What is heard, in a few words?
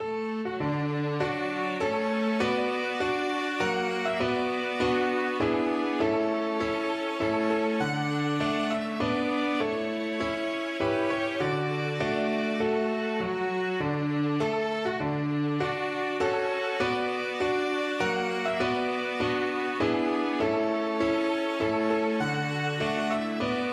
piano quartet; star spangled banner